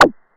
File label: Moog BL; Moog Modular55